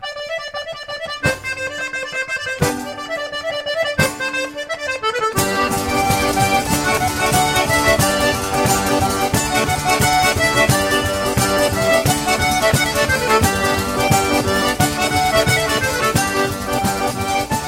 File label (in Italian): Pizzica Tarantata; Italie - Sicile